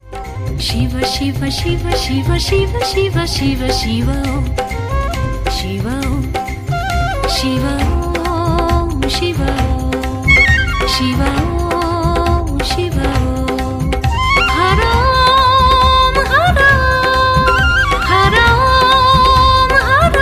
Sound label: Shiv Shiv Shiv Om; Shiva Bhakti Ringtone